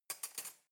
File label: cutlery knife drawer place soft